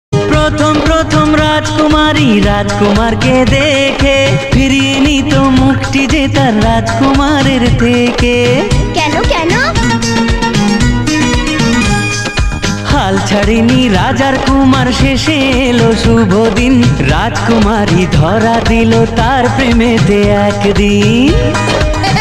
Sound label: bengali ringtone